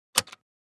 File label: 1930 Radio Button; switches